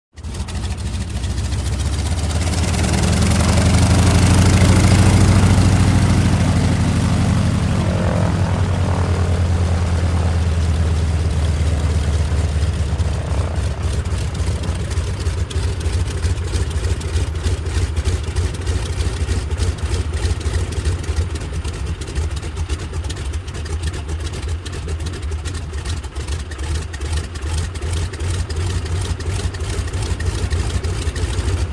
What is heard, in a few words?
Full, Deep Motor Idle, Maneuvers On Ground With Prop Drone, Slows To Sputtering Idle, Revs, Engine And Prop Wind Down. Close Perspective. Prop Plane.; Broussard